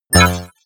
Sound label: sci-fi notification negative